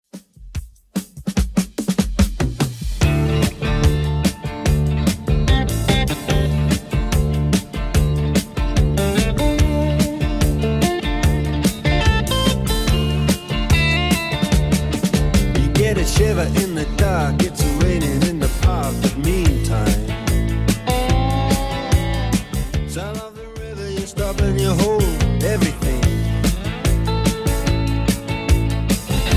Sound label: 70s Rock Redrum 146bpm; remixplanet